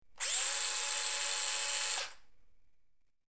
High Pitched Drill, air tool

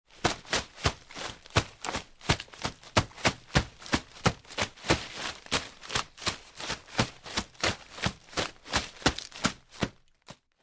Footsteps Eerie Run

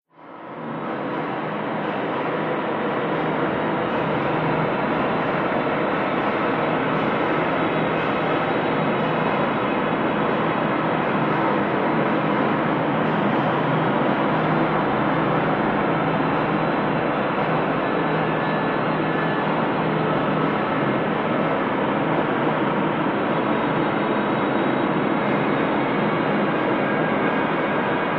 Fast Wind Tunnel Big; airy

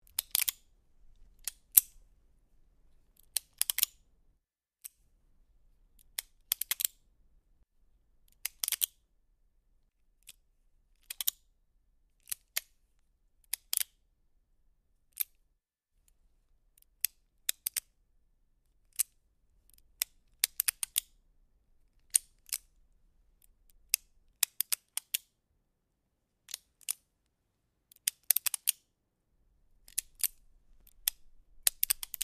Flint Steel Strike Me, lighters & matches

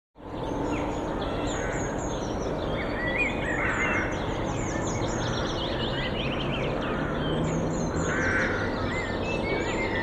Park Atmos City Park

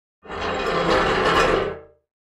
Aluminum Pan Scrape; barrels buckets & cans

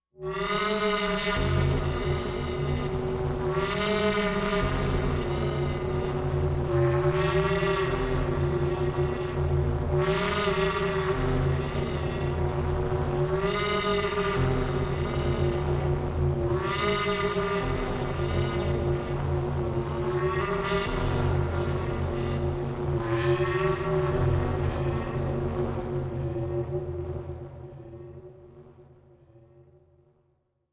Endless Cry Electro Sta, sci-fi ambience

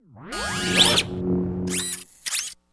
Boxing Robot Fall, servos

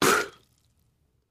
Spitting water; coughing & spitting

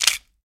Bone Crab Shell Break

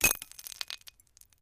Flash Bulb Pops Poofs

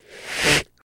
Cat Angry

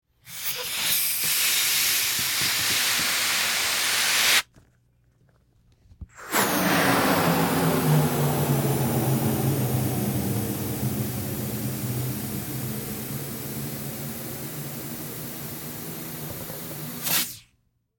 Medium Rubber Balloon; balloons

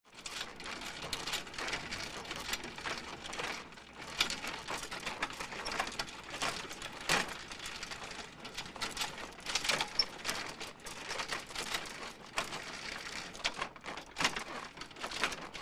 Bicycle Old Creaky

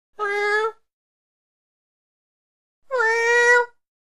Cat Meows Hungry Cat; animals cat

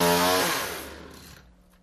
Chainsaw Shut Down O

